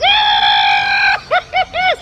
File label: grrnnaaa heh heh heh; ooh ahh